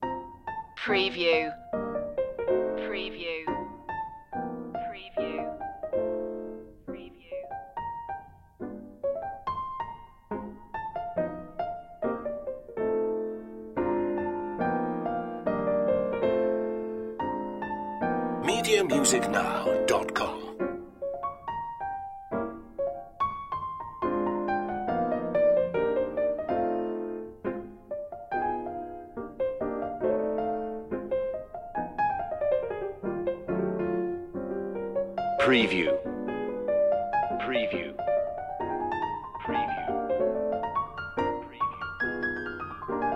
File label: piano only